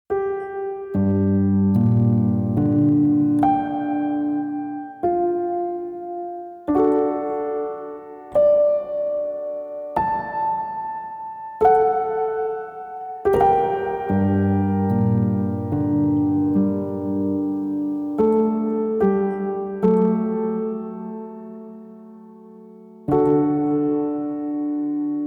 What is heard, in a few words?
PIANO AND STRINGS ONLY